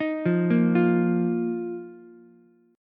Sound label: Eb Suspended 2nd Eb down Ukulele; Ebsus2 arpeggiated; JGuitar